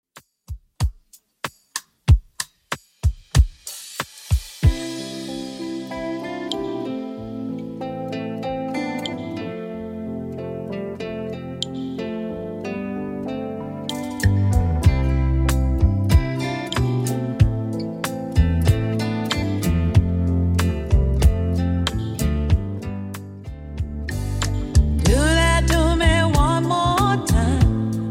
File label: 70s Redrum 94bpm